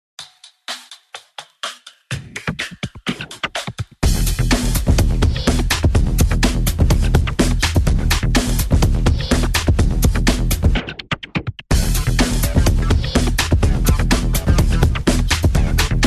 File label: Breakbeats